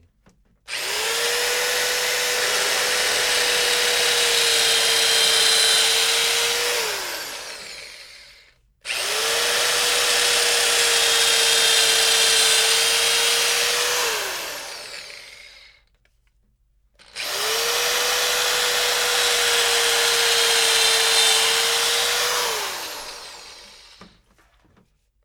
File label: drilling